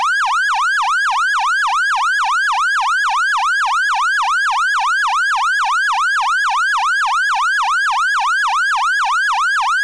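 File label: Federal Signal PA300 Wind Down, siren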